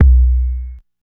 Bass Drop, 808; Street Anthems Sound Kit

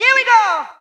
Vocal Phrases; Vengeance Samples; Vocals

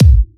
Kicks; Vengeance Samples

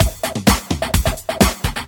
Housebeats, 128BPM; Vengeance Samples; VEH2 Loops

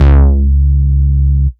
Bass Root C#; Vengeance Samples

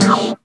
Synth Shots; Vengeance Samples

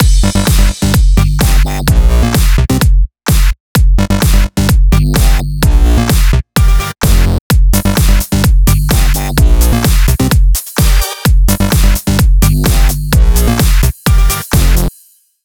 128BPM Mix; Vengeance Samples; Weekend Kit

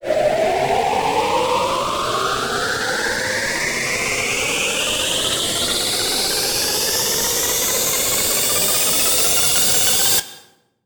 FX Uplifter

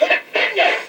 LoFi Vocals Oneshots; Vengeance Samples

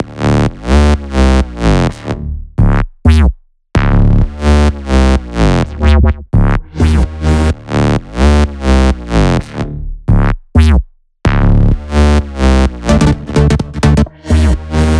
128BPM Melody; Vengeance Samples; VFH1 128BPM Technology Kit

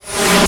Short Fills & Reverse; Vengeance Samples

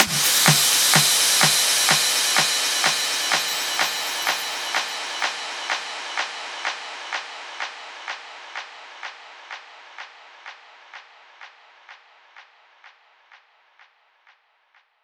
Impacts

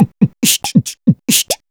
Beatbox Loops, Human Beatbox

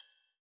Ride, Cymbals

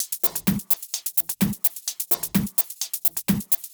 Houseloops 128BPM; Vengeance Samples

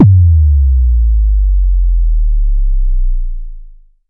VFX2 Sub

